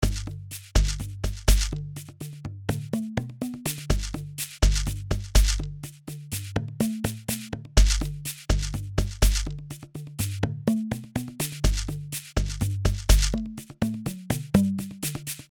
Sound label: Shekeres And PVC